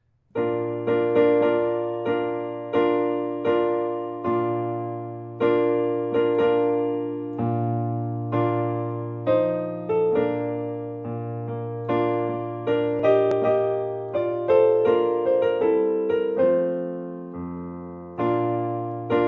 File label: Bridal March